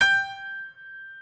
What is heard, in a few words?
piano